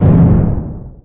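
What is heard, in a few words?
Hit Glass; TrickStyle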